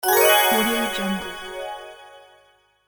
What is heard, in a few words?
magic spell